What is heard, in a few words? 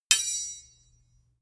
sword hit sweetener